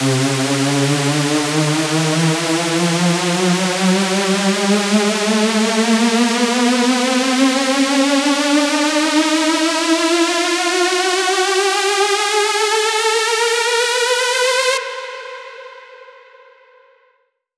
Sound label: Saw C